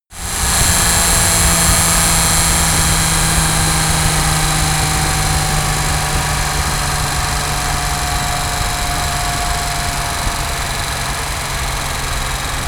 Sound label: car jaguar e-type decelerate engine